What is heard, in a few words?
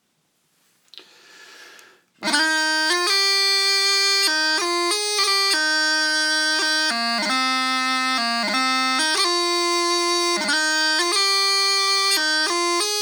March Pipes; Jim Camerons